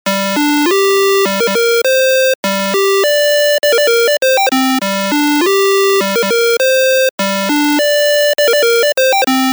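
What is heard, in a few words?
loud ringer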